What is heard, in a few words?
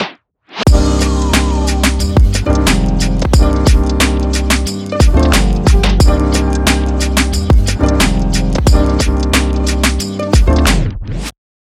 groovy hip hop